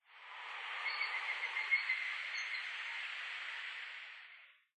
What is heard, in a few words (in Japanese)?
okonoha; nikko